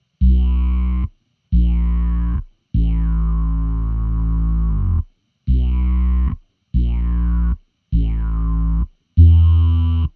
Wahoo bass vocal

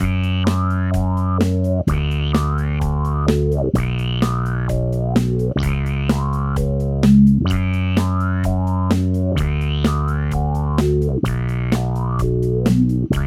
underwater lfo no sync, bass

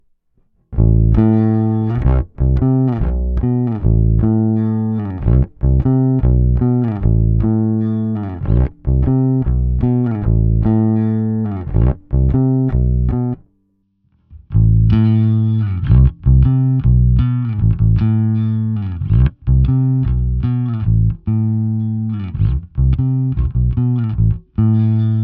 octavius squeezer clean signal, bass

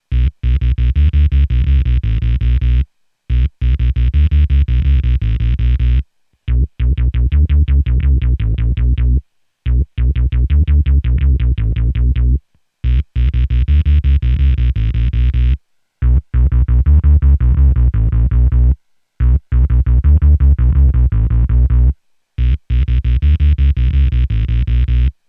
sonuus wahoo gated fuzz envelope down, bass

